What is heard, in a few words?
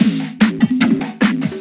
Beats, Synchro Funk; Tekno; Sonic Foundry (Sony Creative Software)